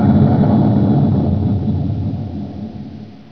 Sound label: CANNON